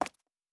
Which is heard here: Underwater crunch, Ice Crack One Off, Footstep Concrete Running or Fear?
Footstep Concrete Running